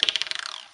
rebound clack, percussion; Misc Percussion

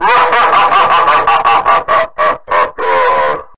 laugh devil

